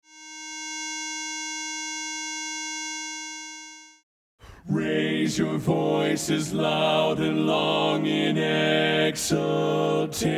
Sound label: Celebrate Harmony Bass